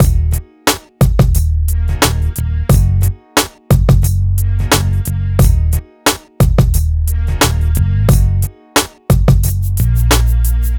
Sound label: MIX, Hip Hop and R&B Drumloops